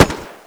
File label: m3 ringout; WAR